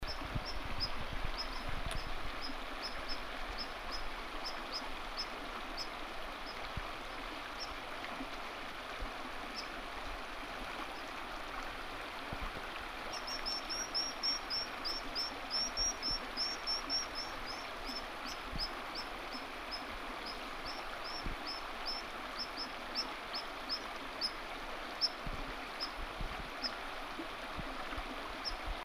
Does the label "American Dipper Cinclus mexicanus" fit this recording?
Yes